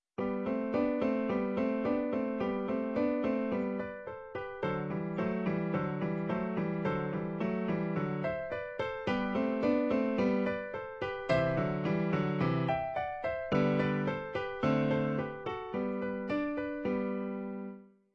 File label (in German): Andante, Klavier; Czerny